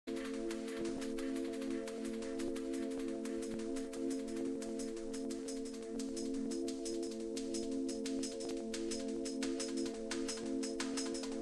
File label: Drum and Bass Jungle; UK Bass Radio; Afternoon Liquid sessions